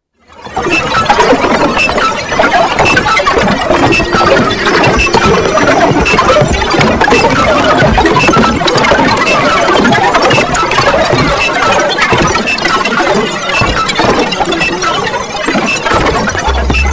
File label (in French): eau meca; residence MDQ